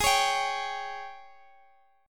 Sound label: Gsharp Major 7th Suspended 4th, Guitar, Drop D; x,x,x,13,14,15